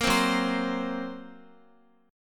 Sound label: Gb Major Flat 5th, Guitar; strummed; JGuitar